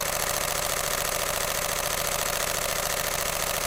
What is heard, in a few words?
Canon EOS 1D X Mark II Burst